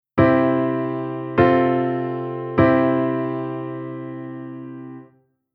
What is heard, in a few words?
Chord Modal